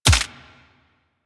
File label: Bullet Impact Head Hit Notification; Warframe; Weapons